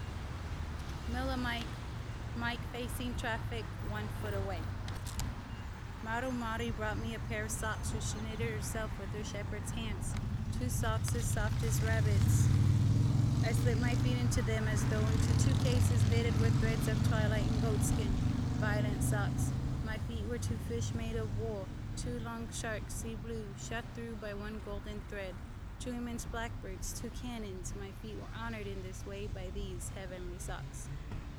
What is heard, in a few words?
outdoor, Milli Mic; 1ft MFT